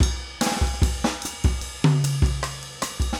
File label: samba